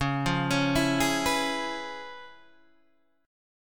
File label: C sharp 7th Sharp 9th, C sharp, down, Guitar, Open G